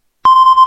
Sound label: radar beep sms